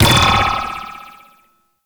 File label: ball bounce goal post; Warframe; Tenno Ball; Missions